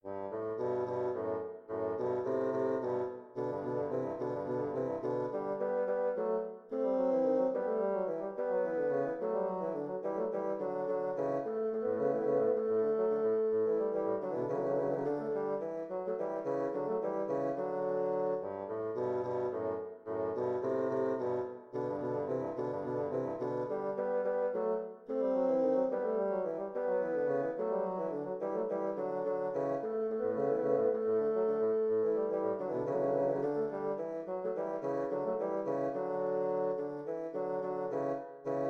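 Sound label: duet 2 bassoons; molter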